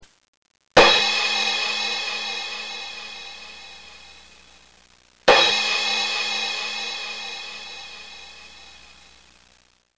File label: Cymbals, percussion; percussion fact file